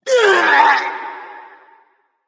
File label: grunt hurt; smite; thanatos